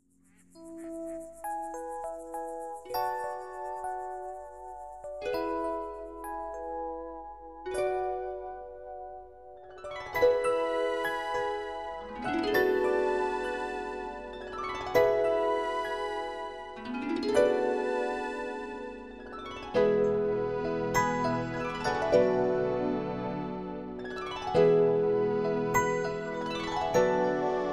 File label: Magical Harp